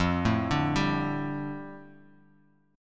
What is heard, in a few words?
F 6th Add 9th F down, chord; F6add9 arpeggiated